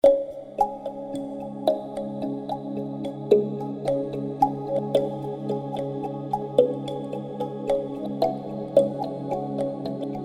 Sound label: Mallet Scape; Storytelling Essentials